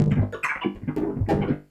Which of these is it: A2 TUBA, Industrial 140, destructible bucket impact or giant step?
Industrial 140